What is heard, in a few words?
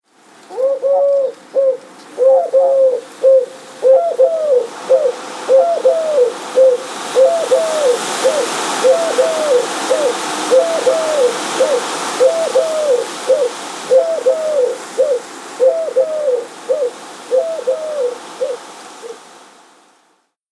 Pigeons Nesting In Tree Windy Day; Bird Sound Effects; Outside Ambience Sound Effect